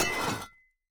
斧刮削声

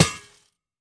刷怪笼破坏声

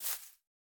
杜鹃踩踏声

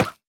犰狳受伤减免声

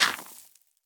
刷子刷沙砾完成声

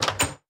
上锁箱子打开声

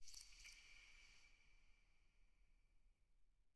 眼眸花长时间闭合声

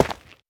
玄武岩破坏声

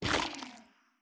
幽匿破坏声

